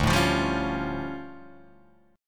D-Minor Major 11th, D, Guitar, Drop D

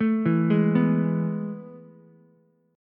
A Major 7th Suspended 2nd, Ukulele; AM7sus2 arpeggiated; JGuitar

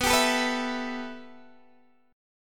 B 7th Suspended 4th Sharp 5th, Guitar, DADGAD

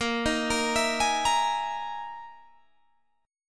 E Major 7th Flat 5th chord

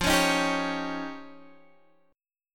F 7th Suspended 2nd Sharp 5th, Guitar Open G; x,10,11,8,8,11

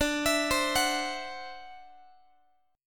D 9th Guitar, chord; arpeggiated; JGuitar

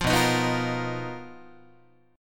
B Major 7th Flat 5th B down Guitar; strummed

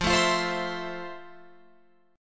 F Major 7th, Guitar, Open F; strummed

